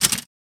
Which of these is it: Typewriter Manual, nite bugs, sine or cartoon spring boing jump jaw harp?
Typewriter Manual